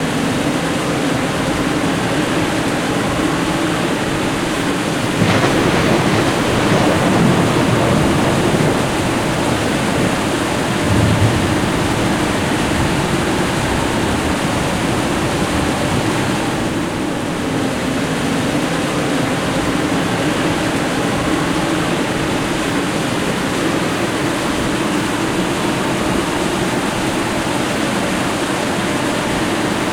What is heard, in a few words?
bgm game windy